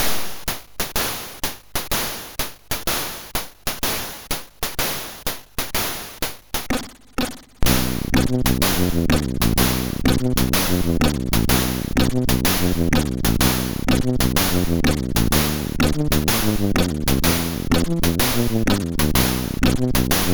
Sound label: Jazz